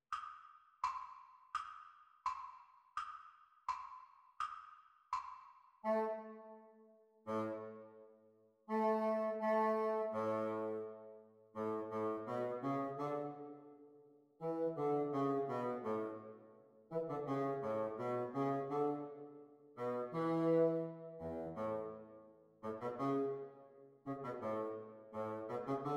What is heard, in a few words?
Bassoon Duets; exam speed